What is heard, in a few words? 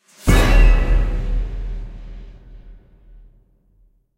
Fear Sting; Jurassic Park The Game